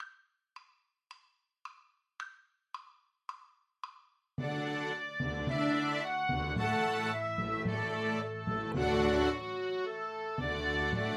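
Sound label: VLC karaoke, Cello; beethoven; ode to joy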